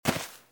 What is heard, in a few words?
grass; firefight